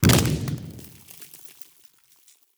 explosion

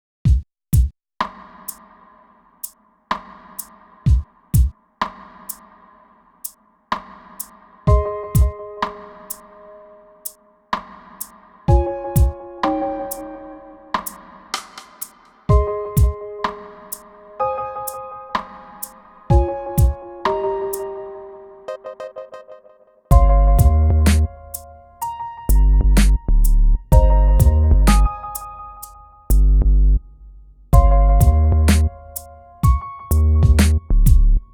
Dub Instrumental; Animal Crossing New Horizons

